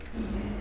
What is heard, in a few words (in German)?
gitter